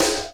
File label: Snare Shard; ZeroGravity Drum Shards